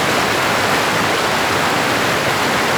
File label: emitter water stream large